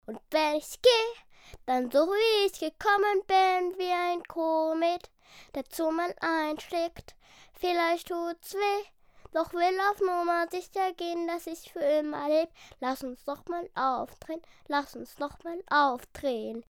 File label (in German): gesang; Kindersprecher